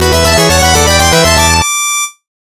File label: Level Complete